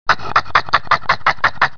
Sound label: victory